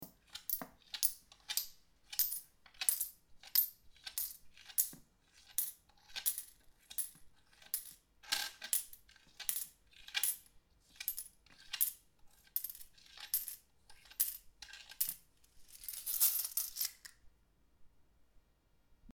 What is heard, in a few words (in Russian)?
schet monet